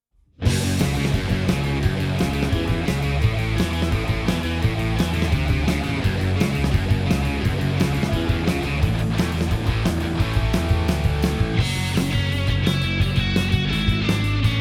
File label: Instrumental Mix